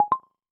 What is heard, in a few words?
Cable Connect; LG; SU870 Optimus 3D Cube